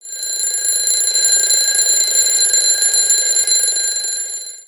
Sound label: Classic Alarm